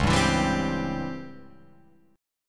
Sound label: Db Minor Major 7th Flat 5th Db down Guitar Open F; strummed